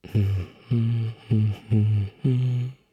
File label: voice humming